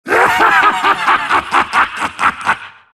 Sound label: laugh; siltbreaker